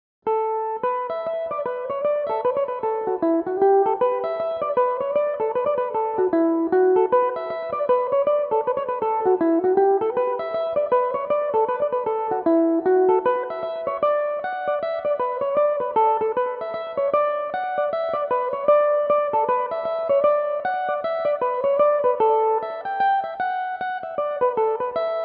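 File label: reel E dorian, Irish mandolin; John Kelly's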